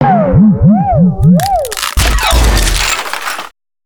Skeleton Tower Fall

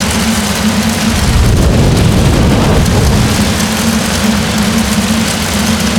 Fire Loop; Nursery; ambience

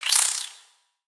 Spider Idle; Lego; creatures mechanical

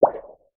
Pet Slip Pop Up; creatures pets

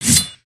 spear, weapons; aronwk collection; ndaudio

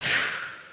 sigh

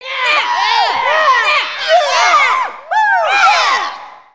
pirate yells

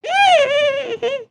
Ribbit Frog Hat Mmmm; creatures organic

